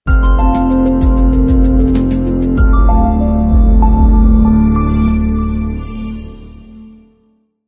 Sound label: Ambient Logo Stinger